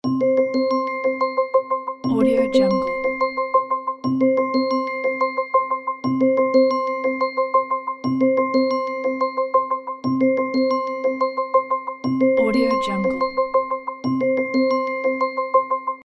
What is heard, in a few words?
crime scene cinematic mallets beat